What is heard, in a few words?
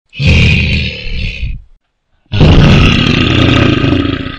bear roar; animals